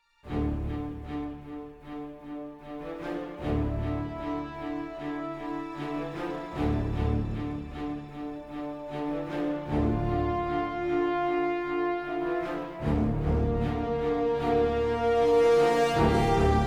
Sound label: The Mother Of All Battles, Orchestra And Choir Only; трейлерная музыка